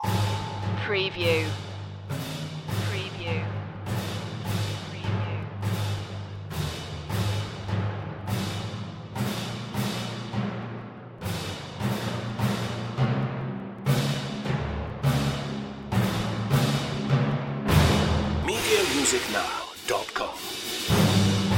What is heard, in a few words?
fight for survival percussion only